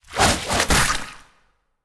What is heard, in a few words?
regular Wild Transform; the wolfs night